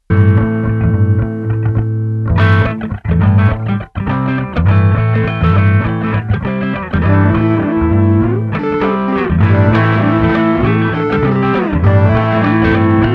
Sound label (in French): guitare, forest